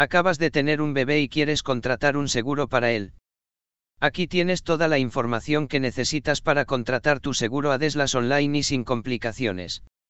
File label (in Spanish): bebe recien nacido